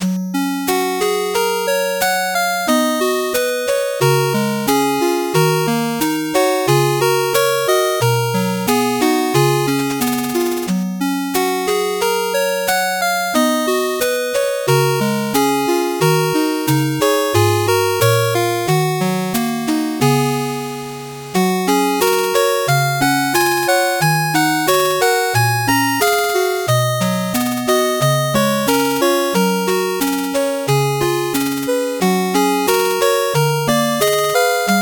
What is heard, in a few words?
8bit bgm slow